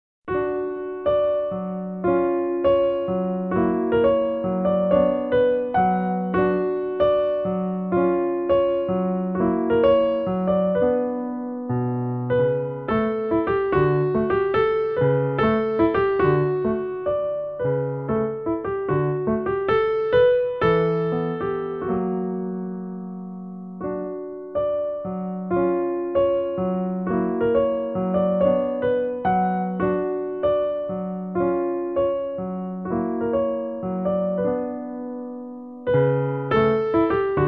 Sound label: mazurka; grechaninov